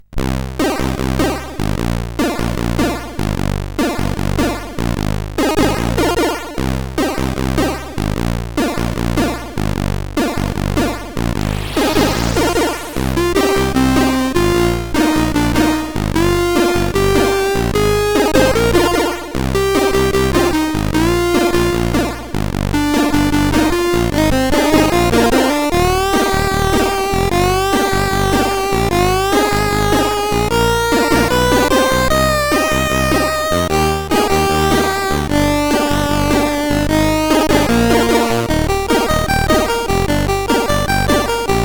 Genetic Jazz sid CSG8580R5

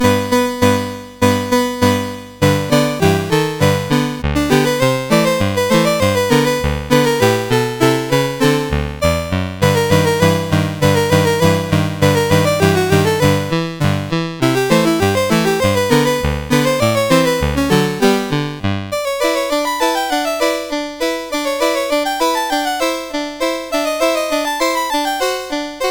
Jingle Bells Jubilee, CSG8580; Christmas

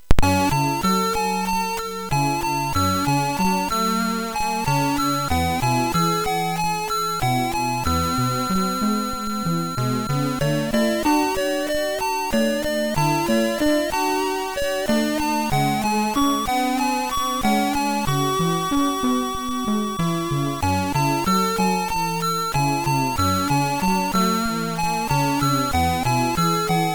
sid MOS6581R4, GAMES; Dark Caves

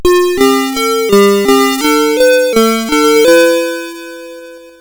Buggy Boy, CSG8580R5

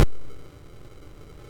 sid MOS6581R2, GAMES; Face Off

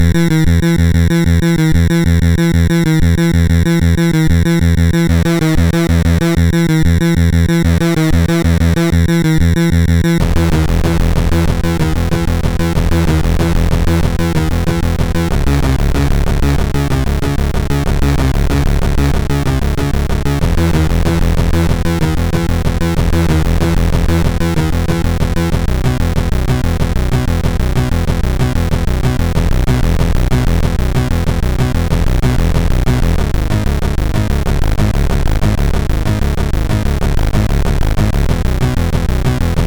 Techno, MOS6581R3

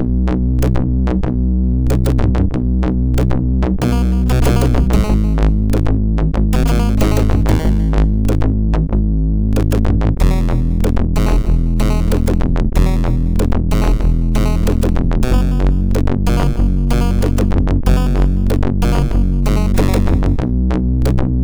MSX sid MOS6581R4

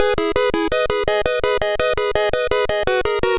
Remix, MOS6581R4; After Earth Remix

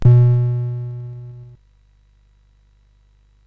Frantic, CSG8580R5

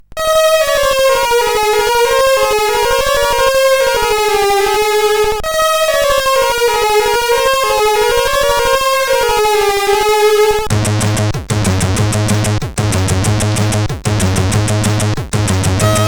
Dynamical Waves, sid CSG8580R5; hvsc

